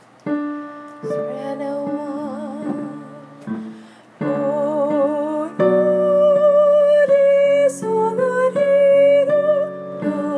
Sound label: soprano solfege